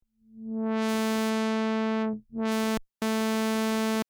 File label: mini V cutoff auto